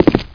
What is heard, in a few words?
STON LAND